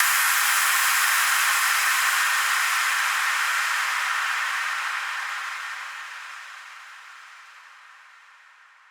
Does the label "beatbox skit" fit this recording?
No